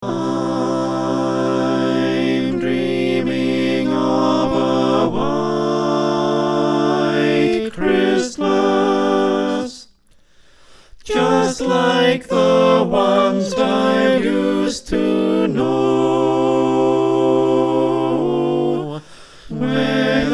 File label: SATB